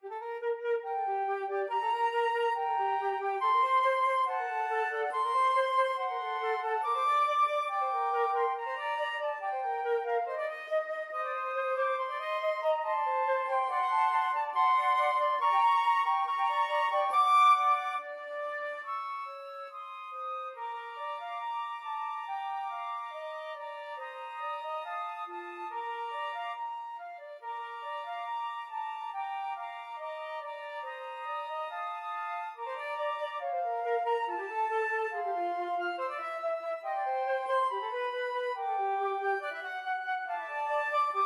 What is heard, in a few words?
confutatis, flute trio